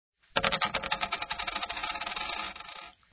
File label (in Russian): zvuk monetki